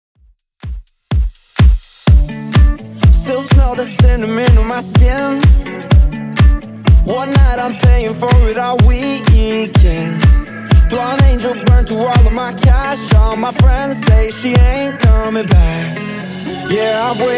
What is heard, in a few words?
Top40 DJ Friendly Redrum 125bpm